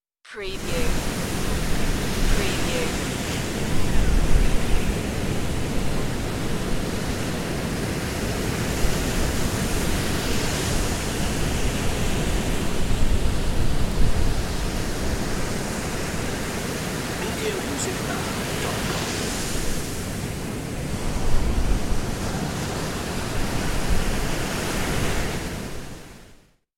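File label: Waves Ocean Windy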